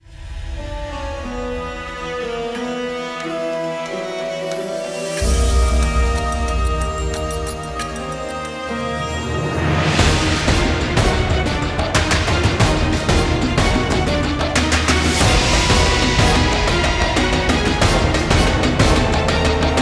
In-Game Music; fast furious spy racers rise of shifter arctic challenge 2022

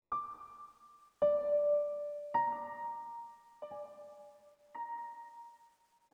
One shot Piano; Zombie Army 4 Dead War

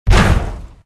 Mechadwarf Death; Dwarves

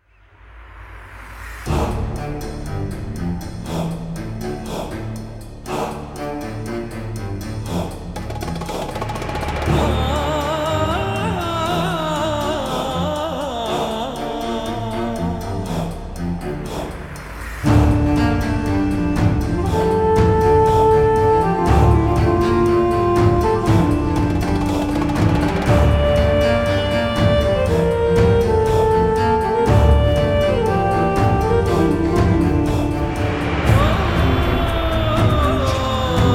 Undead Amb; Disciples III Reincarnation

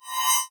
Messagebox In; Nicktoons Nitro; arcade

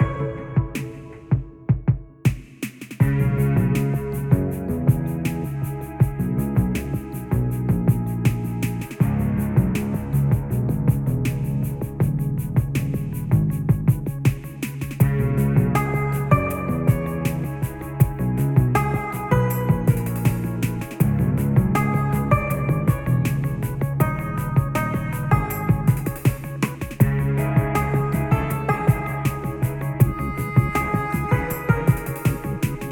Sneaking Theme Western Background Low Filtered; DeadBirdStudios

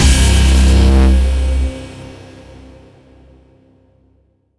Stinger; Heat; mirror's edge gamerip

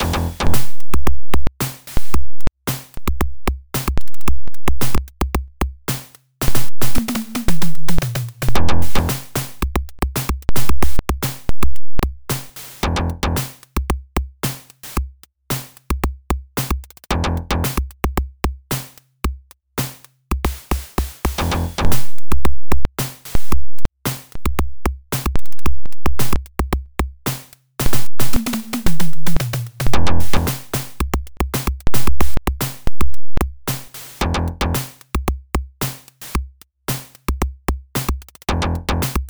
Drum Solo; Adventure Tsukuru 98; PC-98

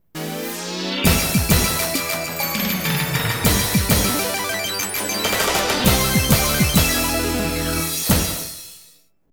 Jingle mission clear; Blinx 2 Masters of Time and Space; Xbox

